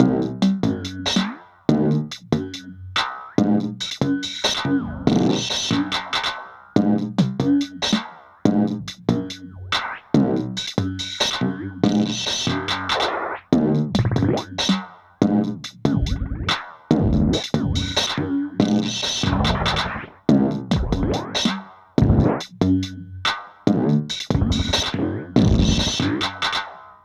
Processed Perc

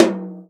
tom, Real Drum Kit, jungle kit

